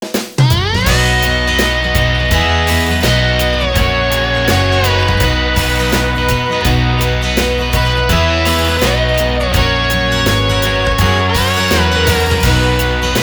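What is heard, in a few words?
ringtone; Sony Ericsson; Venus (Xperia X1)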